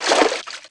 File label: Water Splash; UltimateFishing2020; MISC Water Splash 02